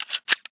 mag in, glock; weapons; 2glock

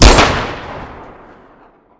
scout fire; weapons

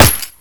usp; weapons

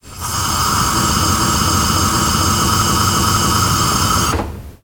gas leak long, chemical; explosions

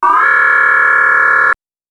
scifi siren; sirens

